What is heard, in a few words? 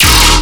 Bass Hit D#, Dubstep